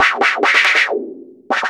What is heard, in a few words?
Loops Fills; Vengeance Samples